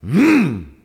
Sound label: grunt attack; smite; raijin